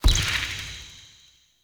Thorn Bow Bird Arrow Impact Default; Weapon Impacts; Player; Bows; Envoy Fey Bow

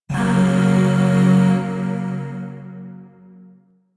Shawzin Yo Chord A; Music; Chords; Warframe; Zariman Void Shawzin; DE

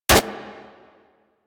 Fire Mech, Weapons; Braton Redux; Warframe

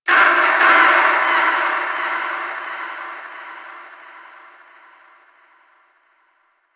Forest Distant Misc Animal; Warframe; Grineer Forest

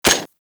Fire Mech; Lato Vandal Redux

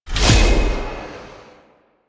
Impale Cast Release; Warframe; Warframes; Hoplite

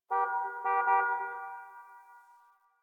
Distant Car Horn; Ambience; Warframe; Nineteen Ninety Nine

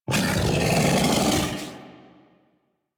Feral Vocal Alerted; Warframe; Kubrow

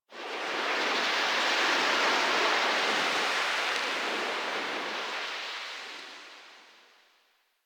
Wind One Off, ambience; Warframe; Sacrifice; Vitruvian Memory

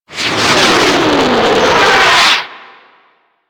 Mortar Incoming B; Warframe; Ambience; Eidolon Plains; Grineer Emplacements; Rocket Swarm Launcher

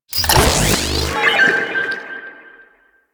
Excavation Power Cell Activate; Missions

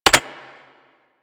Arch Gun Primary Beam Mech, Weapons; Warframe; Archwing; Hildryn

